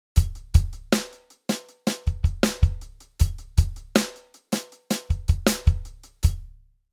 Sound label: funk example drummer